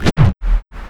FX REVERSE

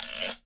GUIRO

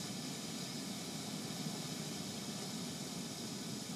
Ambience Loop, outdoor

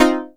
DANCE CHOR; Techno Trance; E-MU Formula 4000 Series Vol. 2

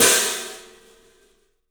CYFX, EFFECTCYMB; 3D Sound; 3D Drums

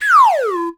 SLIDE W, SLIDE WHISTL

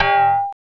MED CAN, METAL & WOOD; Roland - LA Composer vol. 1

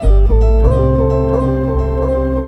Bass FX

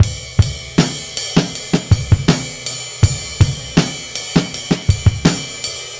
Live Beats, R&B Drums

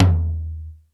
LOW TOMTOM, D110 DRUMS; Crypton - DrumBase5000

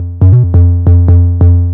bass 138 F, bass-licks, Techno; instrument-loops

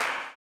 CLAP, blues & soul; ILIO; Vocal Planet

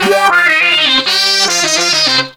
Horn Sect Effect; Effected; Sonic Foundry (Sony Creative Software); Jazz Solos and Sections

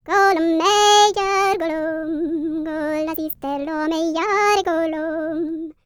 PERSIA, World Voices, Vocal; ILIO; Vocal Planet VOL-5

